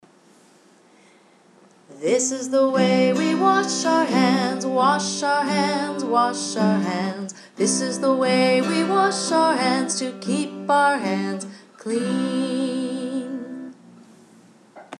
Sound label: washing hands